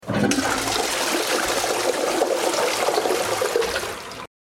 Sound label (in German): wc-spuelung